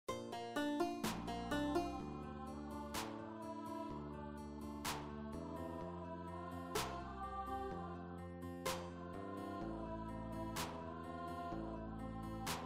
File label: Descant